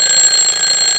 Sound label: ALARM